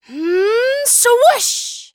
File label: swoo; dqxi; serena